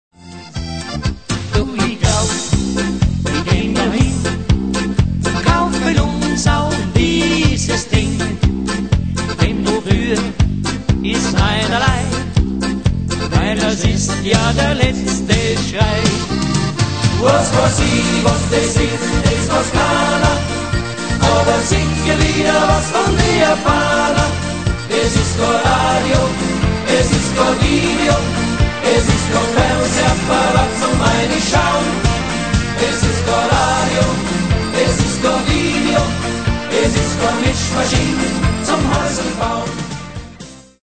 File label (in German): Jodelautomat